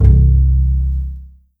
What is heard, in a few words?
C0 PIZ F BAS, string; East West; The Ultimate String Collection